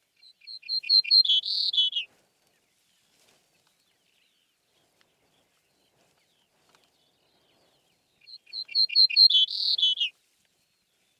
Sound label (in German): steinortolan